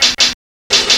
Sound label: HH LOOP; Ragga & Dub Reggae; HH LOOPS; AKAI; USB Soundscan vol.27